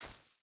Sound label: footstep barefoot grass run; Rust